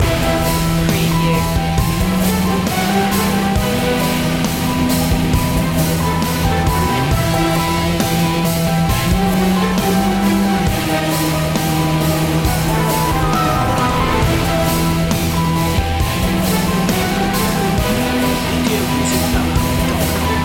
epic hybrid demon

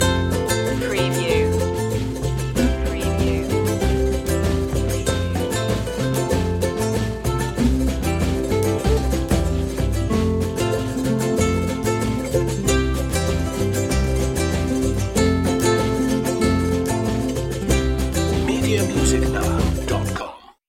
latino carnavalito loop

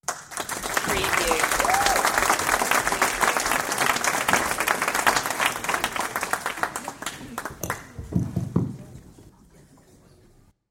Audience Applause; beanstalk audio

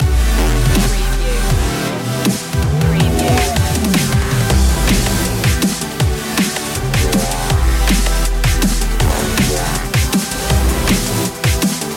moving up

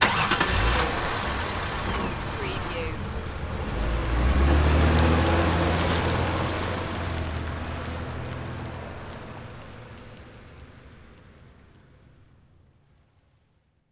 Auto Start Drive Off; sound effects bible

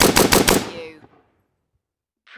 Machine Gun Bren

